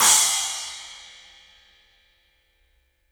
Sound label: CYMBALS; 3D Sound; 3D Drums